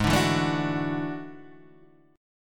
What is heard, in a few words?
E 11th Ab